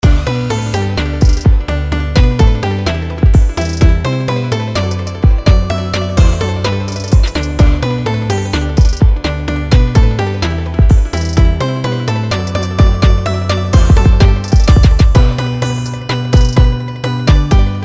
Jingle Bells Trap

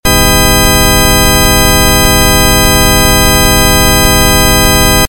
multisine 20 20khz

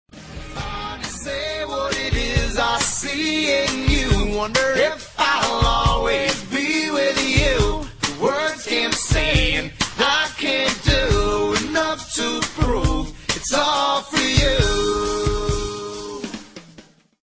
acoustic part

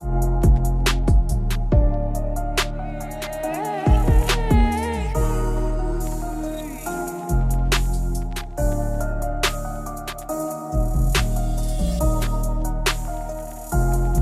Deep Sad Hip Hop Rap

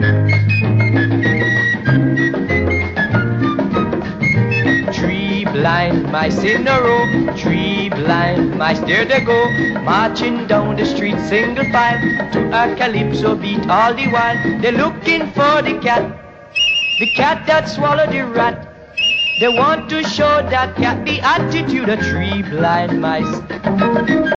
3 blind mice; animals

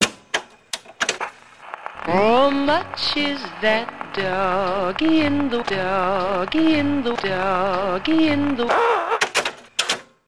Damaged Juke Box

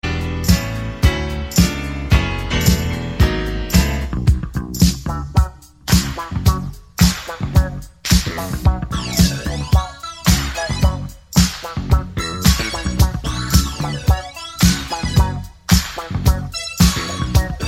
Electro Guitar

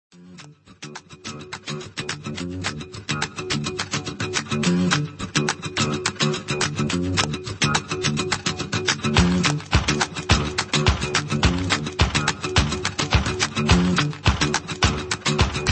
stomp

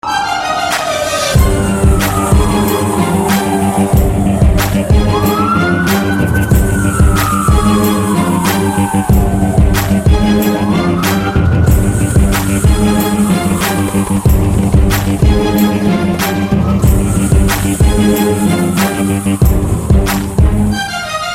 violin hiphop; ringtones

